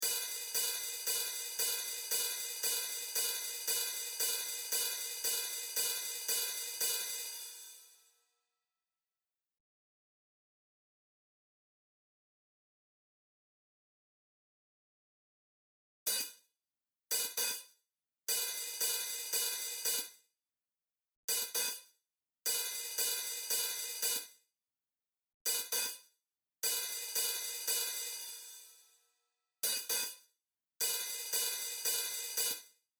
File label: hihat dry